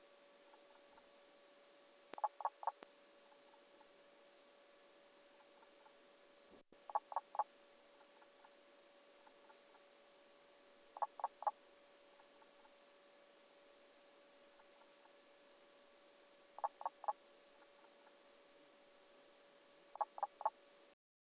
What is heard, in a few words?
pelobates fuscus, frogs